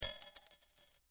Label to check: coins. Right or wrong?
Right